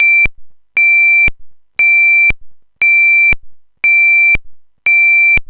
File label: tone